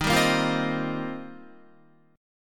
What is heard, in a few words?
D sharp 6th Add 9th, Guitar; strummed; JGuitar